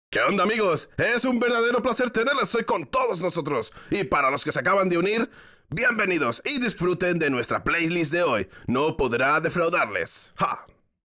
Music Vehicle Radio Events

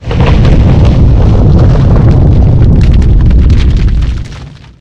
Earthquake; Angry Birds

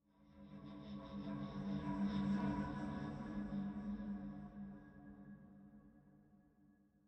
Ghost Pad

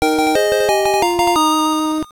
Fanfare Pokémon Obtained

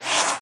Whisper Death; Peace, Death

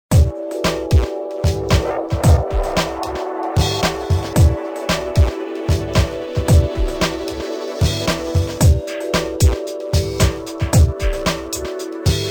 Music Bar; Freelancer

